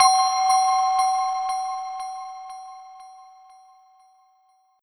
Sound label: mysterious; ＳＥ ﾃｨﾝﾃｨﾝﾃｨﾝ…; ミステリアス効果音 気づく・アイテム取得・イベント